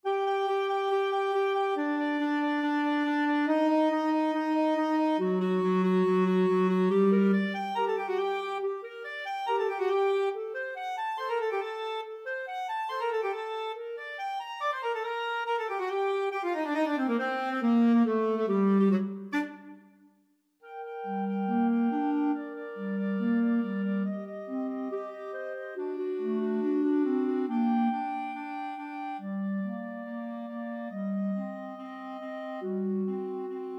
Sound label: mozart sym 25, clarinet trio